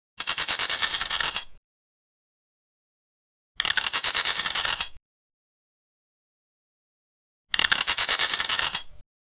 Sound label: country eraser flipping